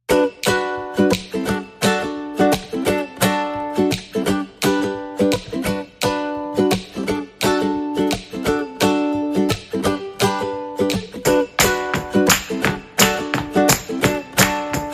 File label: Uke and Whistle, Underscore